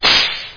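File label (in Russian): мощный звук пощечины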